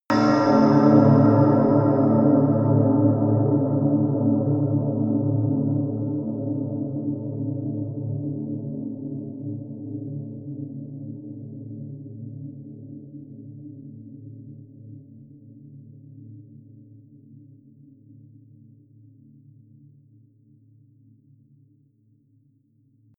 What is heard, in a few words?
sub metal impact deep long, hits impacts